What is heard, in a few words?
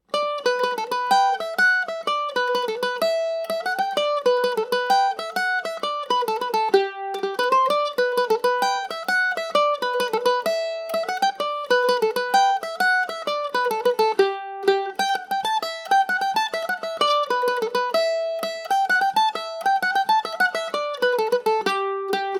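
G major, Irish mandolin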